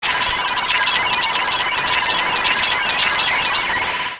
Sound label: computer; operation